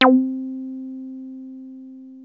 303 C4, TB 303, Synthesizer; Hollywood Edge; Giorgio Moroder Rare Synthesizer Collection

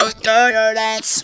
"osso", Funky Vocals; USB Soundscan vol.18; TB MELODY

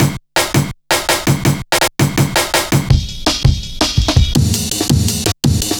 Drum Loops, Jungle

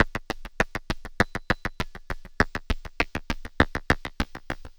perc 100 tight flange, Percussive Loops 100 bpm; Sample Magic - Transmission-X

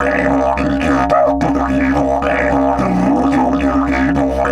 108, Didgeridoo; Big Fish Audio - Didgeridoo and Other Primitive Instruments

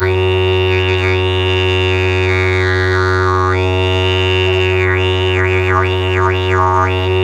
F# drone simple, Didgeridoo; Big Fish Audio; Didgeridoo and Other Primitive Instruments

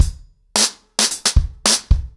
110BPM, ACOUSTIC DRUMS; The Roots Drumloops

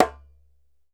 DJEMBE

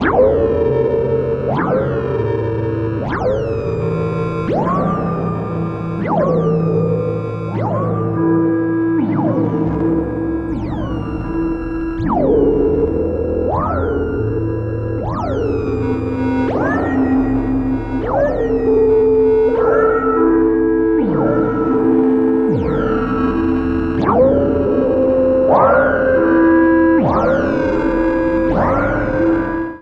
analogue soup A Min, Textures

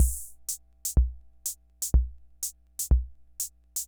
Ace Tone Rhythm Fever Loop; Drum Machines; 300 Drum Machines

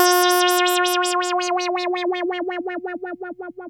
2600 F4, ARP 2600; Hollywood Edge - Giorgio Moroder Rare Synthesizer Collection

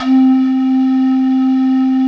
PAN FLUTE C3, trance

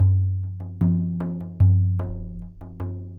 Sm Frame, World Percussion

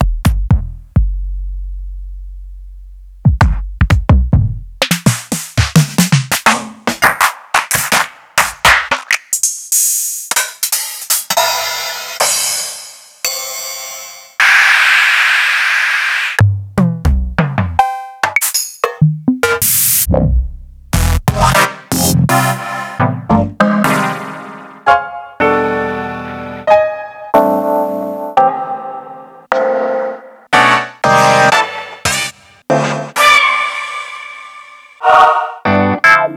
Korg Volca Sample

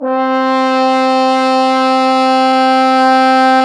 FRH, Horns

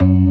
CLASSIC C3, Synth and Organ

